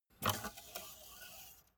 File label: car bmw e46 exterior oil rod pull out